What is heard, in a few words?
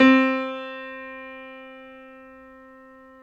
PNO Grand Pno; Club-50 - Foundations Roland